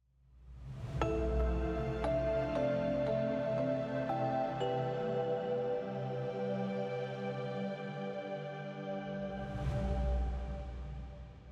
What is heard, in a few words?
Mountain Music, Stinger Tree Arrival Short